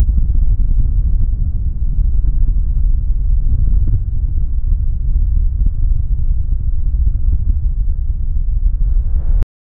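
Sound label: sound of volcanic eruption, volcano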